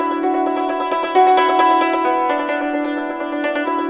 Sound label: YNCHIN 90 D; INIS - Opium